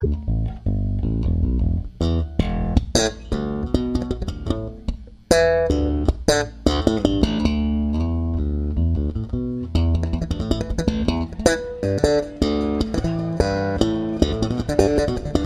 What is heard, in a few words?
Bass Solo